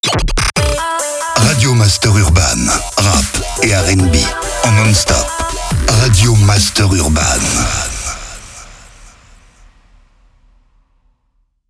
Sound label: JINGLE RADIO; RADIO MASTER URBAN; Habillage